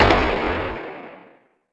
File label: shot; Roland R-70; Perc